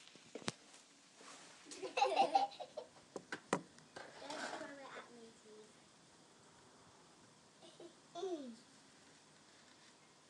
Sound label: children laughing in the sandpit